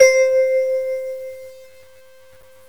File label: Vibraphone; GMPlay; Chromatic Percussion